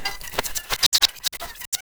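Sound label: interference subtle whisper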